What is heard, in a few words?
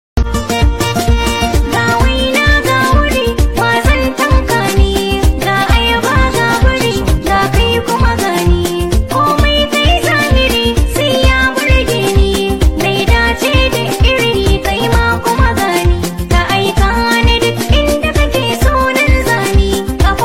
Hausa Soundtrack